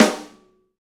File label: Pop Snare; Snares